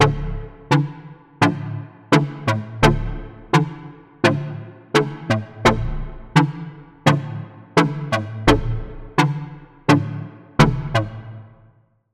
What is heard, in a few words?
pluck, Sylenth1; Routed Sound; Sylenth1 Expansion - Street Vanquisher Vol.1